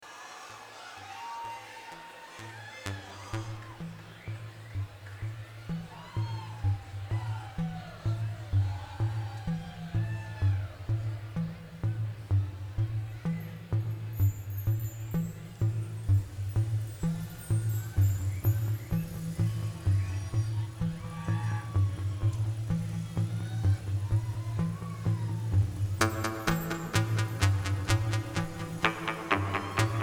Modular Improv

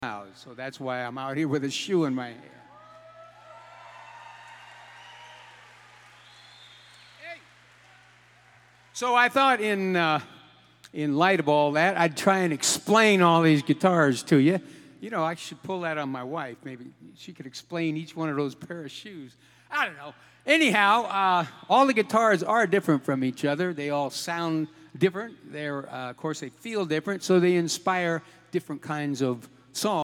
Guitar Talk

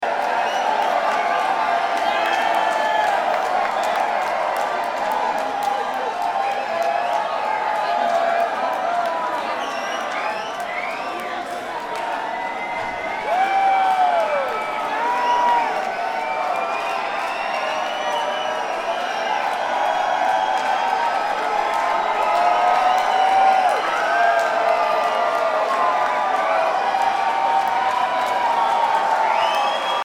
Crowd

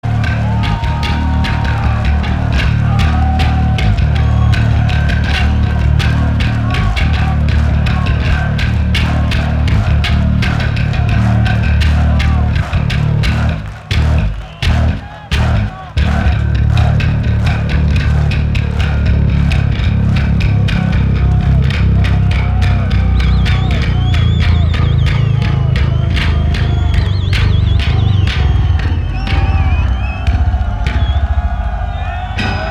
Bass Solo

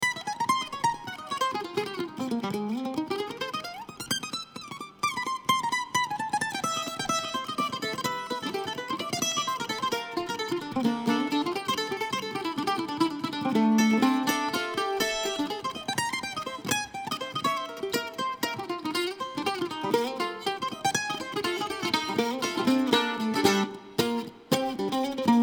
Kentucky Mandolin

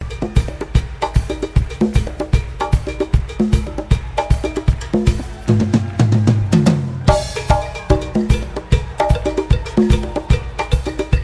Drums

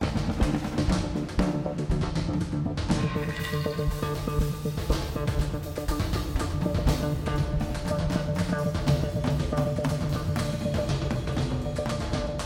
Drums

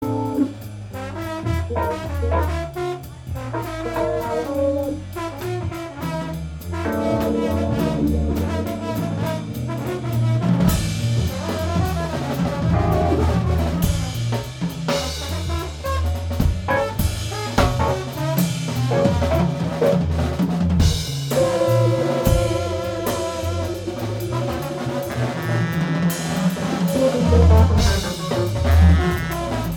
Blues Jam

